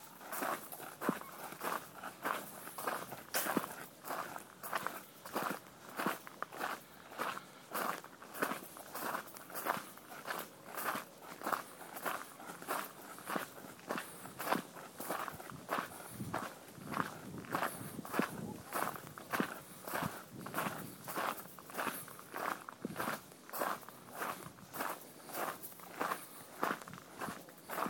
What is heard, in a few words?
walking from the temple garden ickworth house